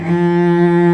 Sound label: F2 cello; InVision Interactive; Lightware VOL-1